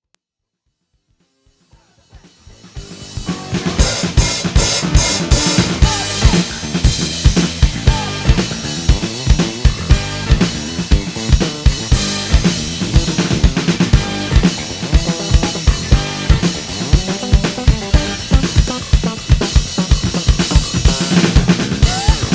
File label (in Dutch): bas drum